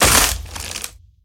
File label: Destroy Corridor; Game rip; MiSide